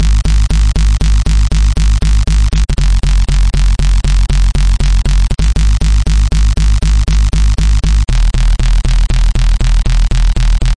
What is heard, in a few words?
bass; On A Roll